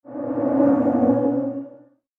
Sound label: Strange Howl, monster sound effect; horror sound effect